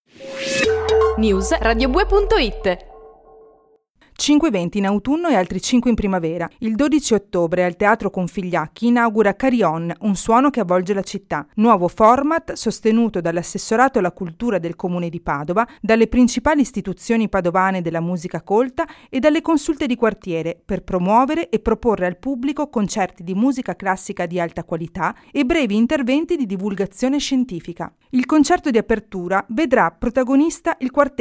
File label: carillon jingle